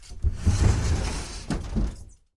fusuma close

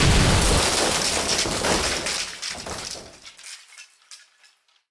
wall destruction

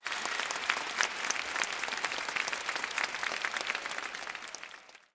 people stadium applause

